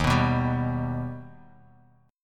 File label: D sharp Augmented D sharp Bass Standard; D#+ strummed; 11,14,9,12; JGuitar